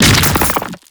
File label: Horrors Arm Spawn; Warframe; ManInTheWall; Octopede